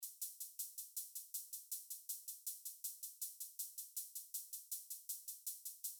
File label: HiHats